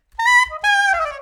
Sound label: sax soprano jazzy